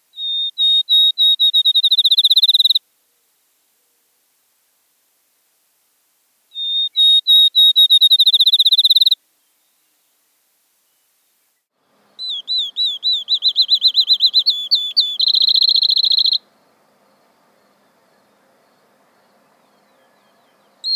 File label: Field Sparrow